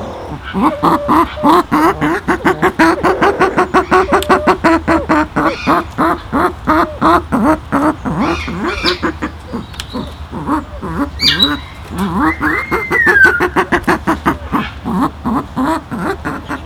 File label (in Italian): Verso del FAGIANO; punto 2 foce del Farfa